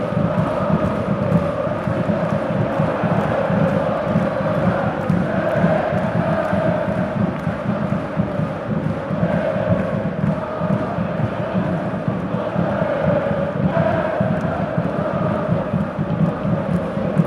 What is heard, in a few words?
stadium chaotic